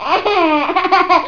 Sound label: BABY; PEOPLE